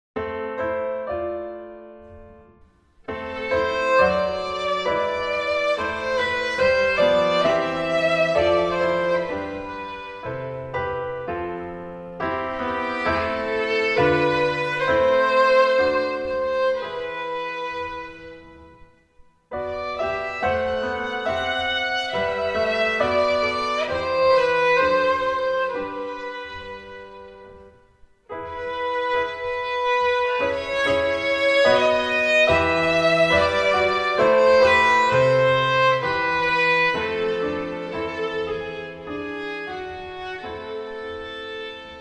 Klavier Violine; Am; T120; In Einem Rosengaertelein Op103; Reger

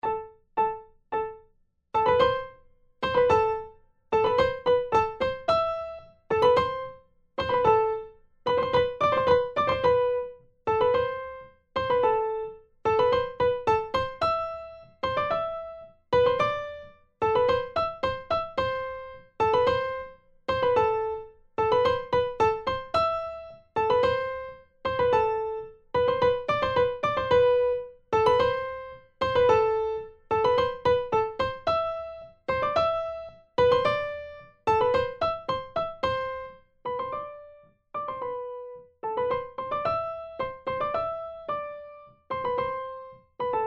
Primo, Vierhaendige Uebungsstuecke; Diabelli

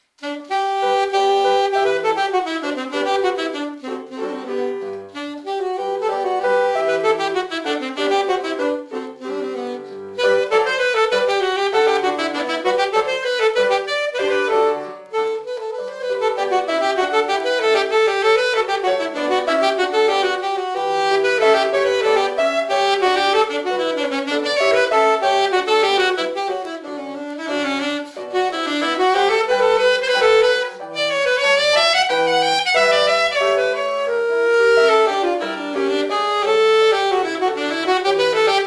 Saxofon; JS Bach; Sonate Nr6; Allegro

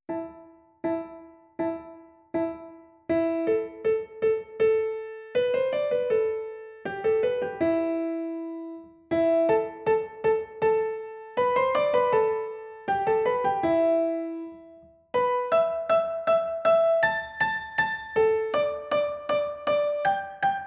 Arabisches Lied T80 Primo

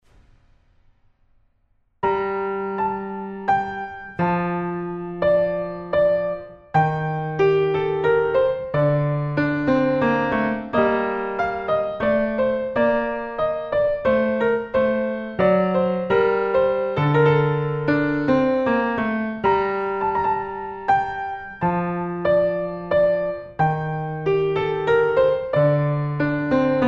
Menuett G moll; Bach

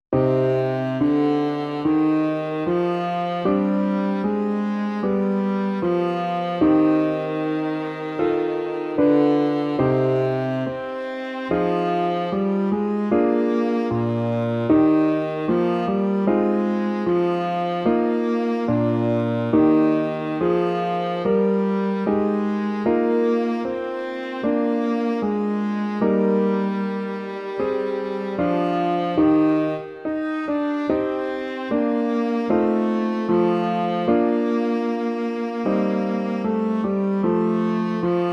Adagio ohne Sax; Playbacks; Bach Johann Sebastian; Sonate 4 BWV1033

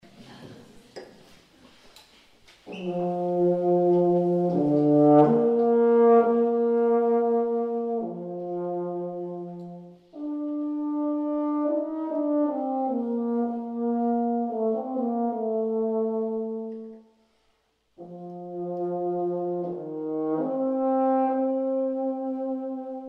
Euphonium Meets Piano Zugabe Duett; Konzerte; Live Mitschnitt; Euphonium Meets Piano Teil 2

